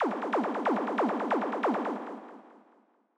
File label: Ragga Blip, Grime, 138bpm; Zero-G Sound Sense Grime Scene; Construction Kits; Da Suspects